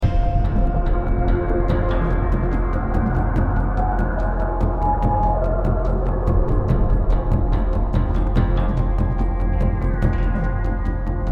music danger; freelancer-hd-edition

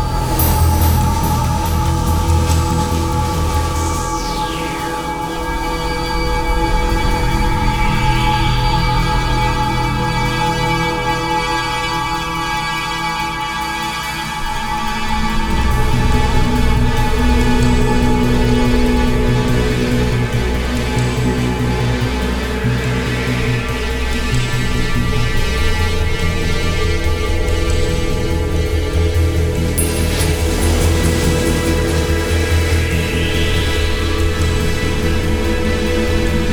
music space; freelancer-hd-edition

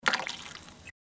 step water, footsteps

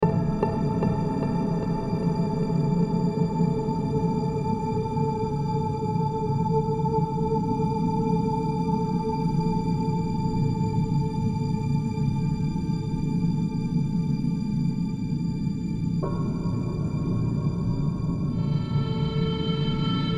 music anticipation light; freelancer-hd-edition

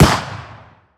close, shot shell; ACE3; Arma3; CookoffSounds

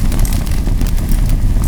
fire, ambient

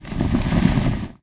embers, ambient

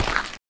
step dirt, footsteps

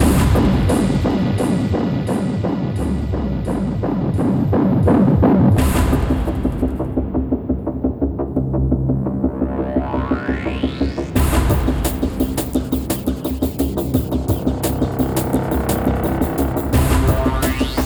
music race start; freelancer-hd-edition

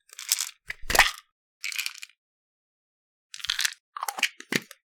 Pills; ACE3; Arma3; medical treatment

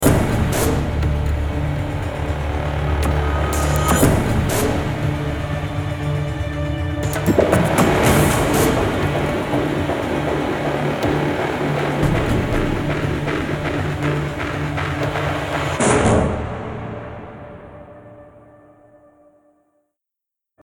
music stab danger

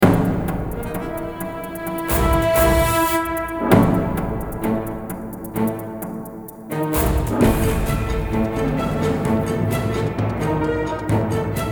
music battle; freelancer-hd-edition

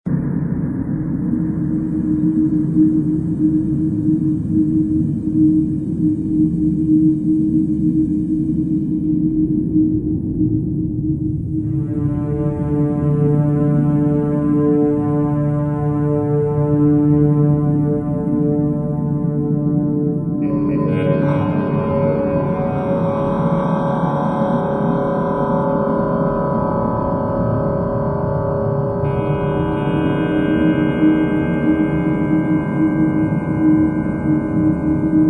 zone nebula, ambiences; freelancer-hd-edition; nomad

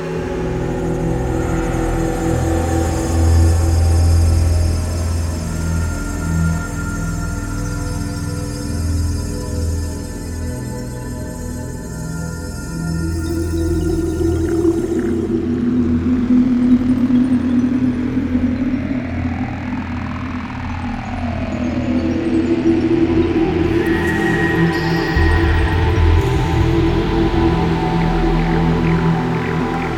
music space; freelancer-hd-edition; omicron

